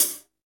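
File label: hi-hat, hi-hat real; Sound & Vision - Gigapack I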